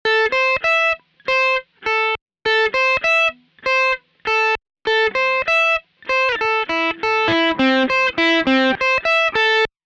Broken Chords; Example 4: An arpeggio-based riff; guitarist

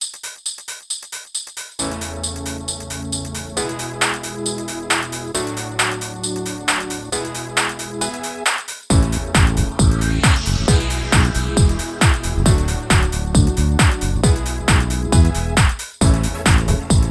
I V IV V Dance; Progression Practice: I-IV-V

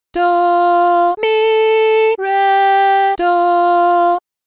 Easy Melody Solfa

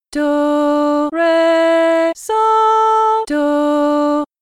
medium melody solfa

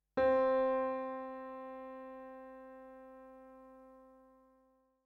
tonic

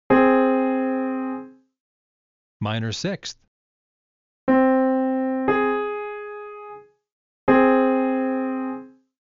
Minor Sixth Example; interval

